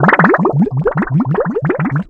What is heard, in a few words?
fish; ambient; dota